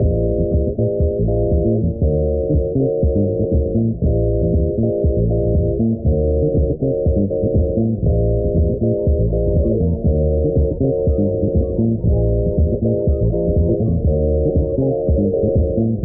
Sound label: keys bumping club scene, loops, phonky